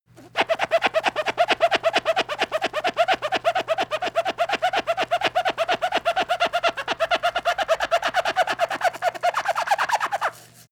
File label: sponge squeak fast